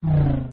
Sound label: car spawn; traffic command